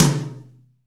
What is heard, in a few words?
ROOM TOM; EdgeSounds; Drum Mashines; R-8 DRUMS